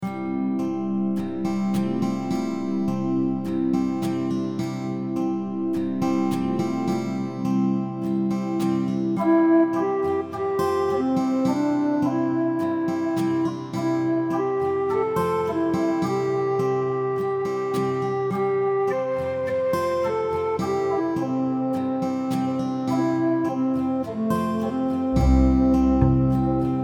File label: BACKING